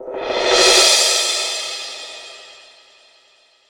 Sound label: crash sound sample d key, Royality free crash one shot tuned to the D note